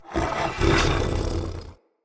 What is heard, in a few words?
Corrupted Battle Dog Attack Breathe Snarl Active Idle; Animals; Vocals